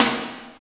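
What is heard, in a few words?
snare drum, Drum & Bass; Ueberschall; Drum & Bass Flash Trackz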